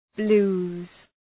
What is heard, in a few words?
blues